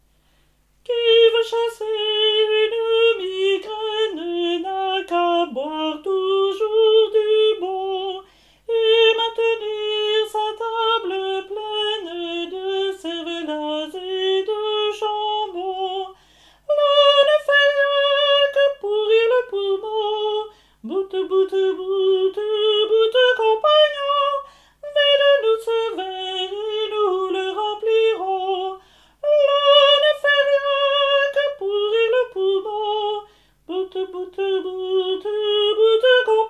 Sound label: tenors; chanson a boire